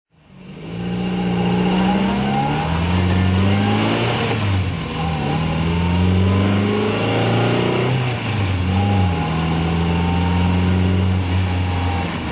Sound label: mga twincam drive